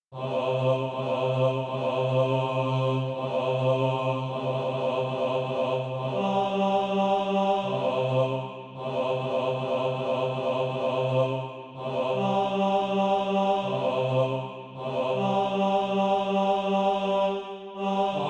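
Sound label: bass, Sheet music (SATB) including Practise Music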